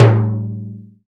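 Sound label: PER PROCU, Procussion, percussion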